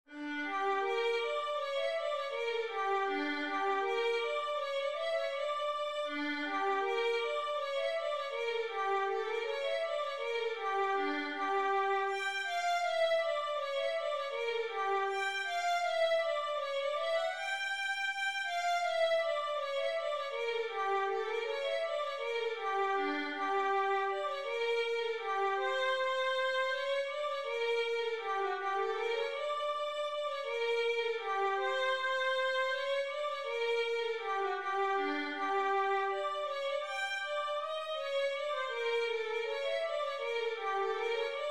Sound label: hasapikos violin